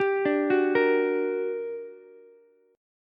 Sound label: G Minor Major 7th G, Ukulele; arpeggiated; JGuitar